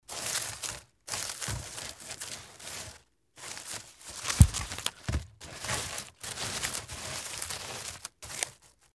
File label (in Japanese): gasagasa